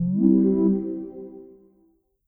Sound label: incoming invite; Ubisoft; incoming invite from user